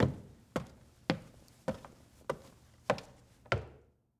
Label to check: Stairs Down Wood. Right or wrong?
Right